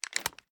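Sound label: pistol reload fast mags large end; gmod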